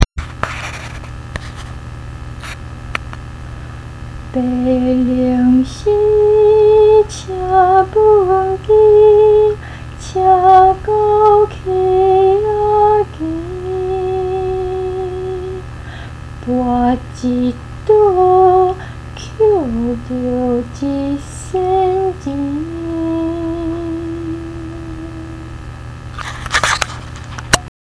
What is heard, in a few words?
Egret